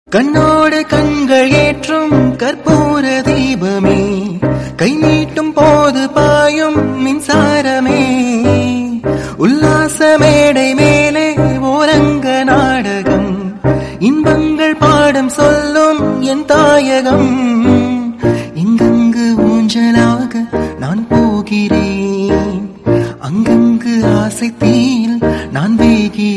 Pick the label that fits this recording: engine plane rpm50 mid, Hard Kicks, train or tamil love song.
tamil love song